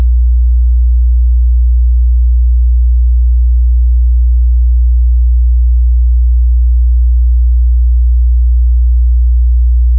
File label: kings piece is checked, chess